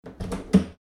door

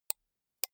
computer mouse right click slow soft

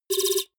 typewriter sequence

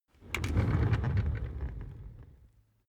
office chair loaded trundle

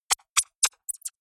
electronic bird chirp short

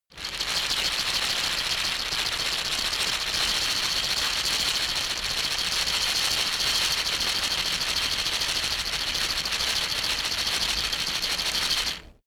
shaker wood rough rattle fast soft

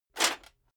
metal plate armor movement single

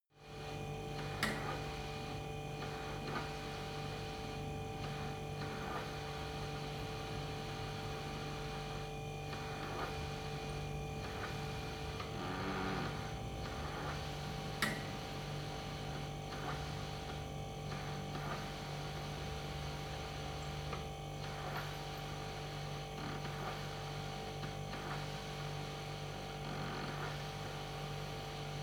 refrigerator hum

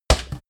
ball bounce

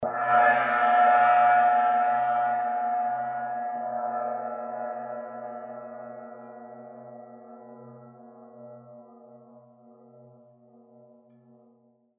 tib vajrajama bell

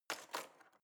knife wood drawer place soft

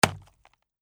punch fist through wall

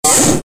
servo agressive

